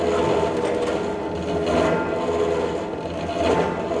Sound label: Gear; Puddle Plus